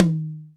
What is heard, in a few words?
HR16 TOM; Crypton - DrumBase5000